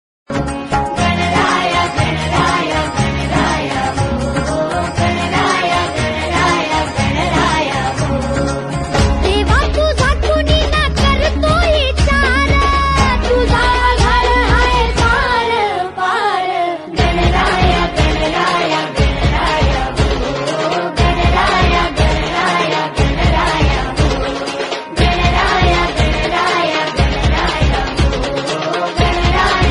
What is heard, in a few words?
hindi song